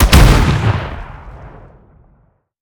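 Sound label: concussion explosion body; gmod; viper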